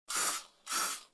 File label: spray can